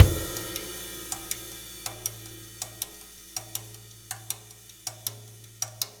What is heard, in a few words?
Russ Loop, Laidback; Sonic Foundry (Sony Creative Software); Drum Tools